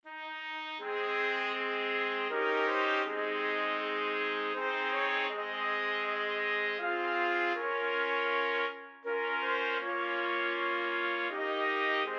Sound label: trumpet quartet